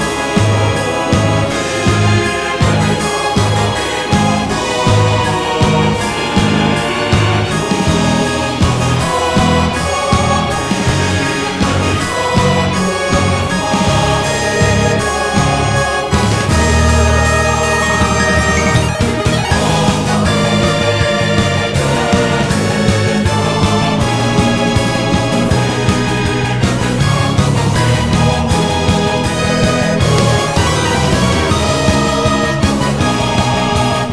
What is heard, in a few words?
Battle end; vivid knight switch windows gamerip 2021; Nest Battle Big end